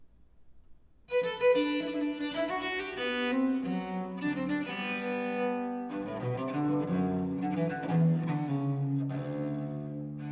corant for lyra viol

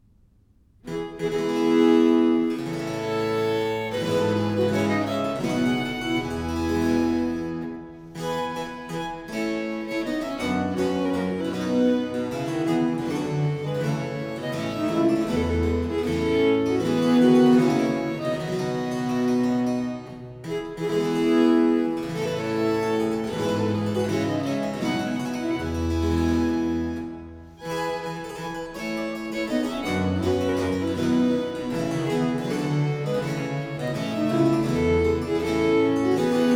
suite no. 4 in four parts, in f major, viols

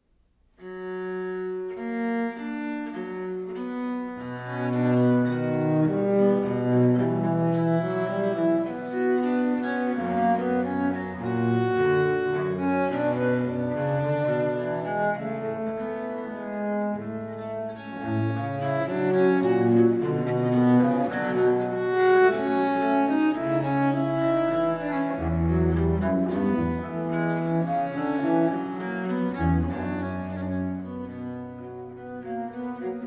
duo for two bass viols, in c major minor

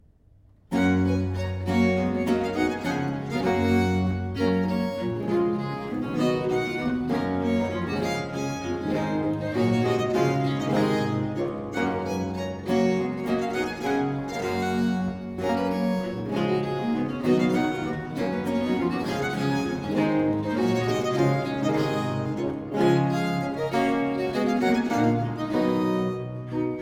suite no. 6 in four parts, in g major, viols

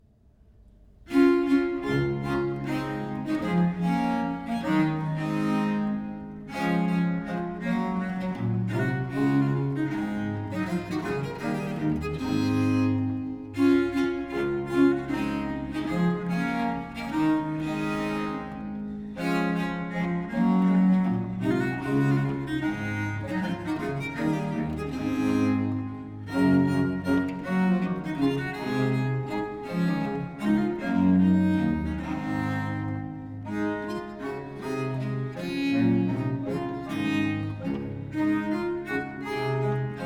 duo for two bass viols, in d major minor; duo no. 2

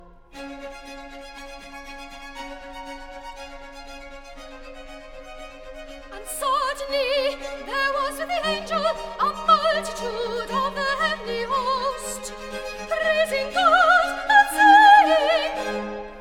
recit; and suddenly; Handel Messiah

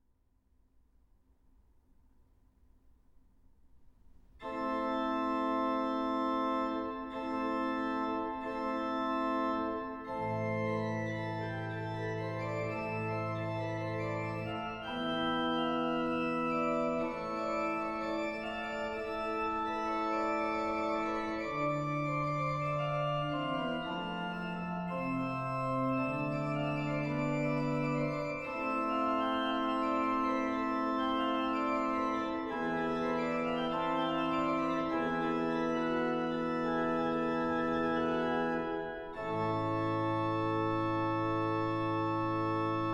intonationi d'organo, intonatione del nono tono; venetian church music

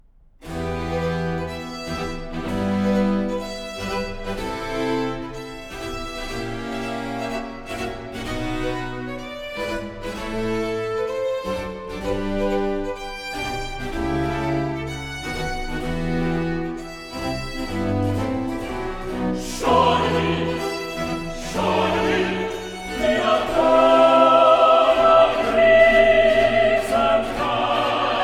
chorus

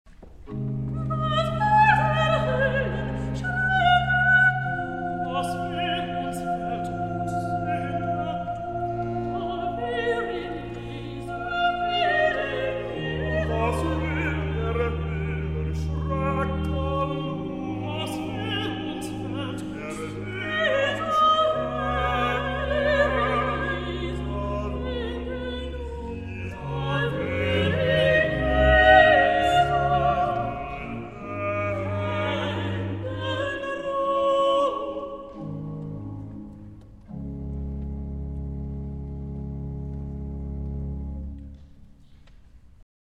cantata vi quartet; bach christmas oratorio

